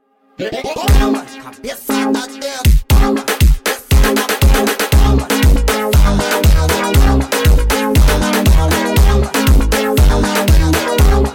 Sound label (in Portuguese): Rkt Version sped Up